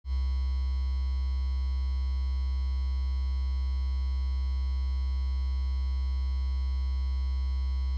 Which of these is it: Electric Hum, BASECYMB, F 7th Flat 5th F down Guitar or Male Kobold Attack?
Electric Hum